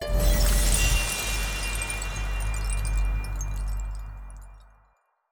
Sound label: rise on